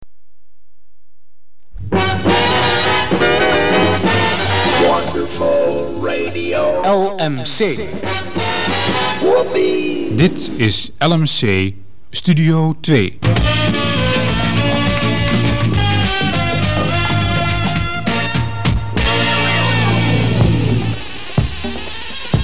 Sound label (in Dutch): gevarieerde muziek uit studio